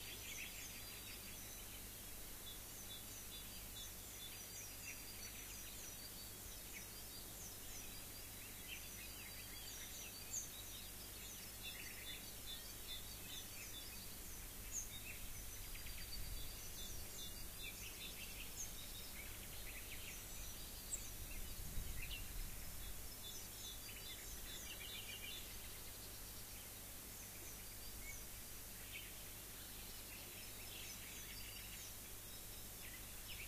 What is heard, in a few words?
rayol farm; SEAMED